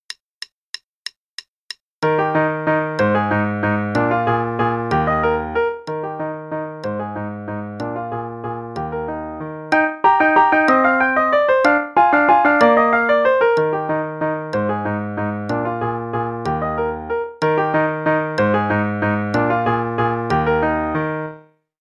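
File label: Play-a-long, Dance, dotted quarter=63; Neefe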